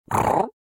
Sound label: Croc question